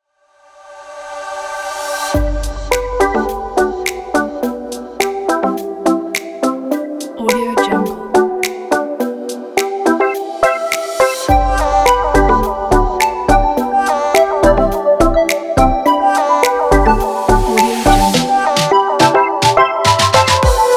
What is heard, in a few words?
tropical house summer